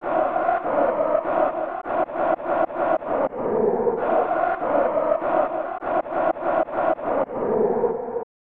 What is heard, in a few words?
Crowd Chanting; snes; Kick Off 3